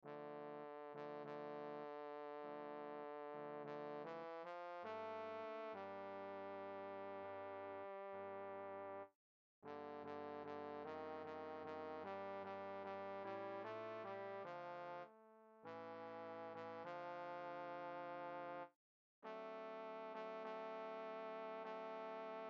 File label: bass